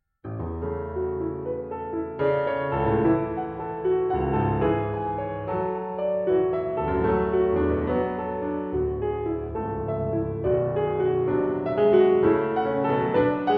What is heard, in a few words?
Variations for Piano Variation 3 Andante